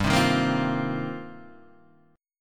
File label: C Minor 11th G